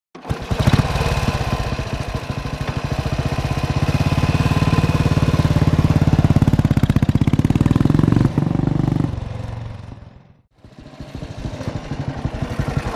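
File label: Start Away Norton Motorcycle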